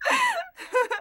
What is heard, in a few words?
Laugh